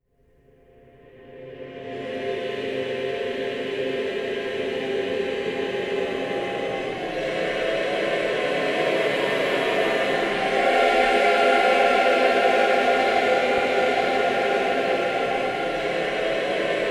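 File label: 12 tone reverse, avante, voices; AVANTE G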